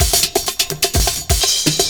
PERCS, 70 s Breakbeats

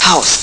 house; Amiga Soundtracker Sample Packs

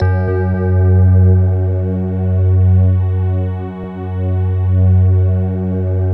BELL C, Slow Bell Choir; Optical Media International - Sonic Images Library

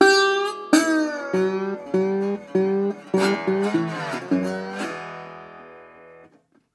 Blues Guitar Samples; ILIO - Fretworks

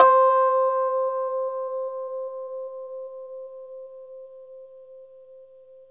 RHSM C, Rhodes; 3D Sound; 3D Keys VOL-1

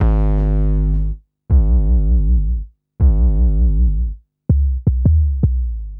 Stone Bass, Drum & Bass; Fluid Dynamics

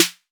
808 SD Orig, Snare Drum; Tape808; Goldbaby Tape808 & Tape909

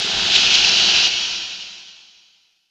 noise, production effects

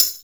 CHEAP TAMB, TAMBOURIN

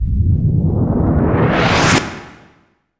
IMP WHOOSH; Cinematic Impact

